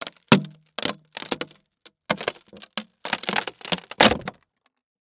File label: wood panel crackle